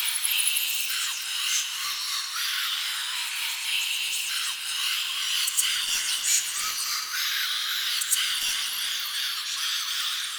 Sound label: Soul Ability Energy Amount Loop; Soul Steal